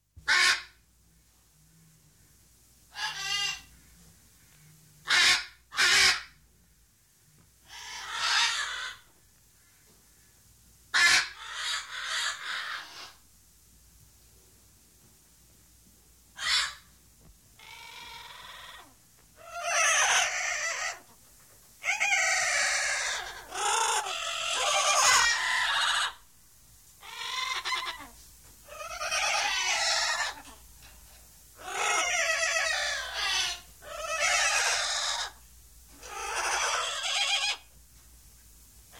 PAPPAGALLO TACCHINO

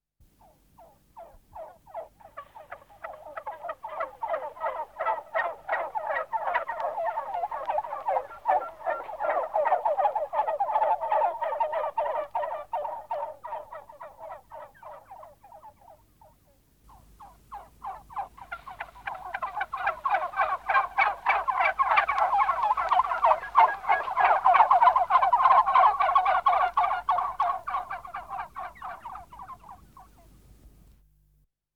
UCCELLI GABBIANI

